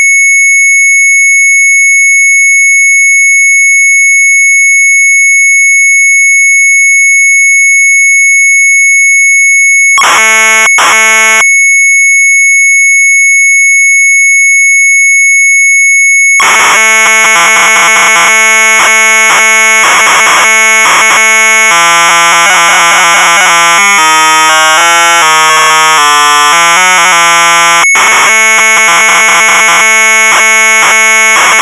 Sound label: Sharp MZ800 Tape; Moving Searcher; retromelsarchive